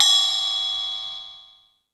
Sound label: Lazer Drums, E-MU Pro-Cussion; drum machine